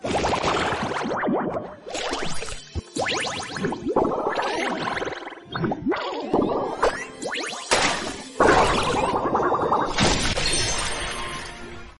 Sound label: bouncing slime; puniru